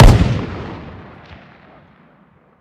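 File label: weapon lmg fire player atmo ext; gmod; viper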